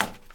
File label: Rolled Paper Slapping